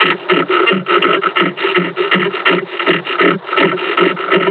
panting, combine charger; Half Life Alyx

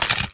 electrocuted spark

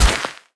carpet impact bullet; physics; surfaces

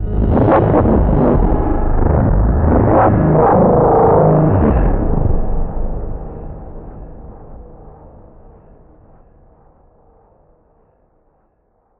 approach warp; Half Life Alyx; music; vault

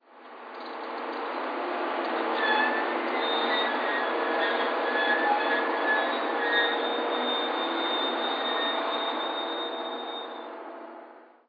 crane move; ambient

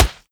dirt, bullet impacts; physics; Half Life Model Pack

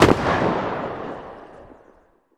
mag7 distant; weapons; Counter Strike Model Pack; CS:GO

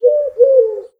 idle coo, pigeon; critters

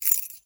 jingle, metal keyfob; physics; props

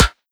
metal prop, bullet impacts; physics; Half Life Model Pack

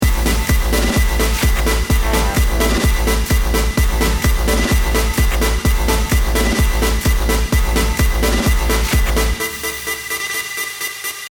round ten sec count, music

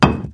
concrete impact hard; physics

